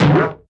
Bounce Bubble Bounce

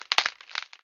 Crackling ice

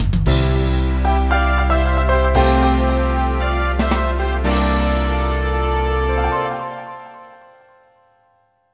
(Jingle) Game Start (Rhythm Boxing); wii fit u

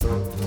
Music Segments Neutral Theme Start Bpm128; asterix obelix slap them all 2

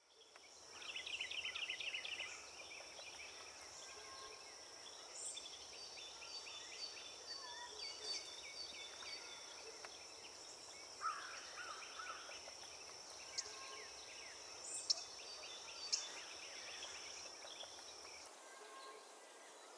Ambience; super mombo quest; Mombo-Ambience

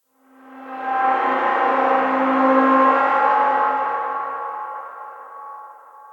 Amb Canalisation Amb OneShot; Puddle; puddle plus ios gamerip 2018

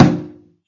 Toilet door

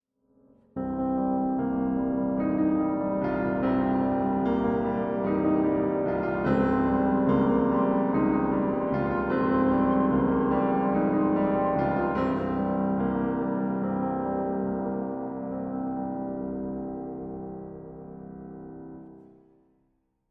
Awkward Meeting

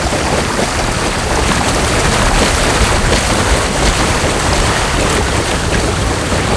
Board To Water

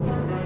Dramatic Start 64bpm; Music Dialogue

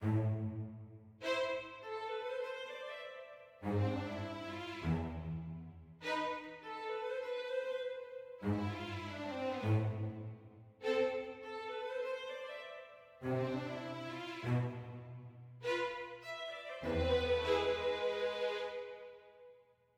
mysterious med; Quivr; PS4 Windows gamerip 2016

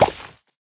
Bricks brick destroyed

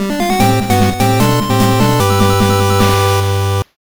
Got Item; Cave Story; Famitracks

